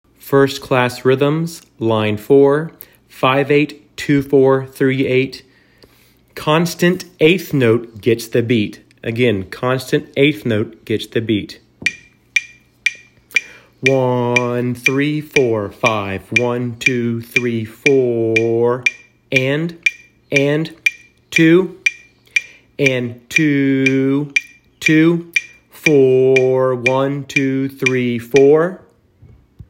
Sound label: rhythms